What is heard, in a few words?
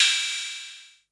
open hh; eddyflux